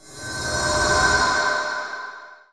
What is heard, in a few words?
Mana Shield